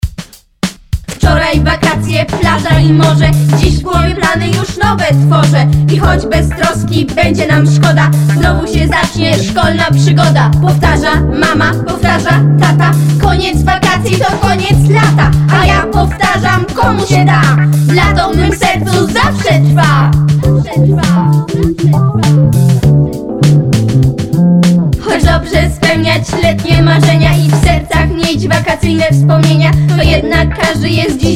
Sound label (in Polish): Rap na koniec lata wersja wokalno instrumentalna